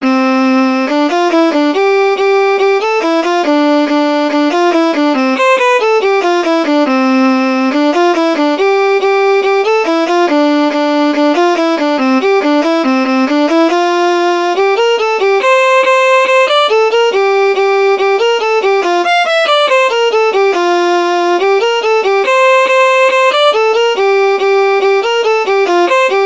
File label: Can Can Polka